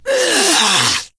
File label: Wound; Ionar